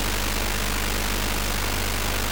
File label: noise; ScreenSavers; No signal